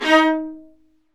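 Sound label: Marcato, Strings; Vlas Marcato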